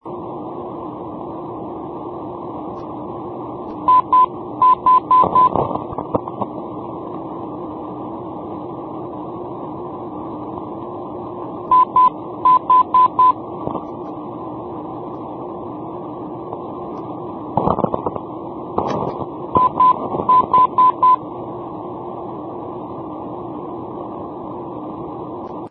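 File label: non-directional beacon; ilha rasa ih-315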